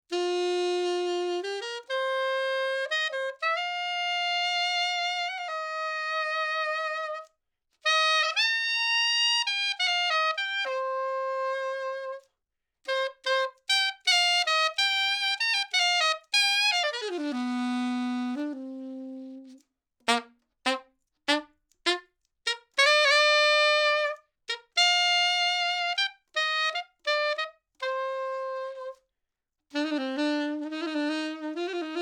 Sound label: Sax Soprano 50cm C Low